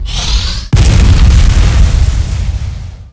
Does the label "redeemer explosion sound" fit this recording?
Yes